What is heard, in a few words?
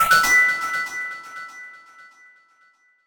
ready; TWBlue